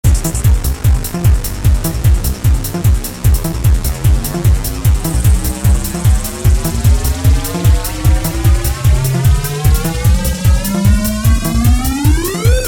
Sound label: techno